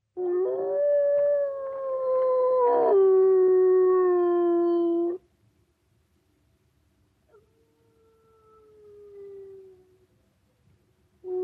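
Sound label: several wolves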